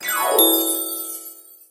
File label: Chest Open Ui; Superbrawl